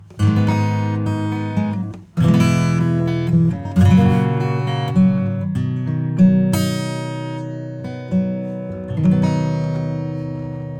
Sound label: acoustic Pure Harmonics; Kelvin